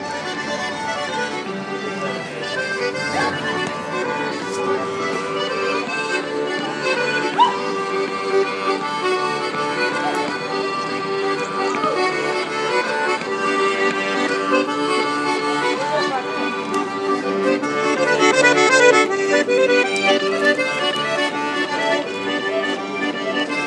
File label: a roman accordionist busks on marlboro street cork; on sunday